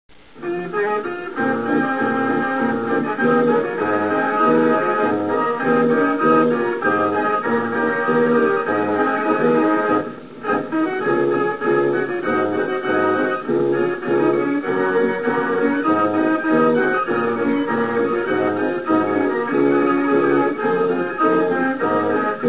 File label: Erector Sound, metal construction toys